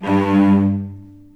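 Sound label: G1 arco long cello, right; East West; The Ultimate String Collection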